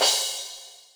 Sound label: Akai XR-20, Drum Machines, Cymbals